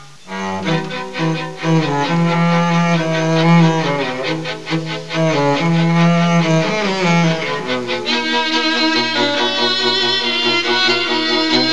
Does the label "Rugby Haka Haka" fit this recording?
No